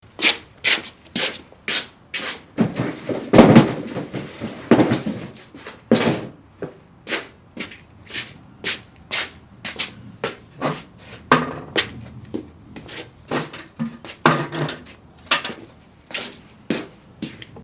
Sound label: scuffing on cement